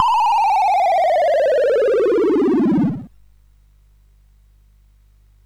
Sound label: SFX, CSG8580R5; Narco Police